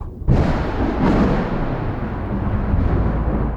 THUND; XXL 800 Production Effects

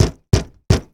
Plane P40 Hit Bang Canopy; Airplanes

